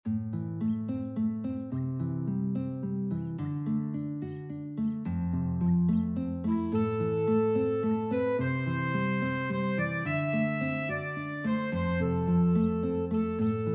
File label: guitar alto sax, alto sax-guitar duet